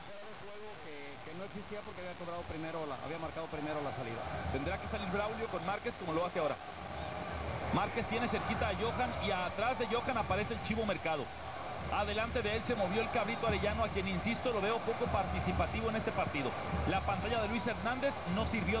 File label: court futbol